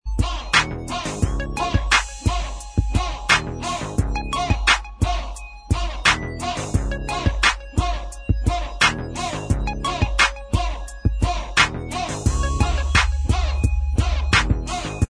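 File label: backing vocals track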